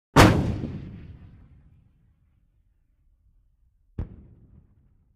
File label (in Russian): zvuk vystrela iz gaubicy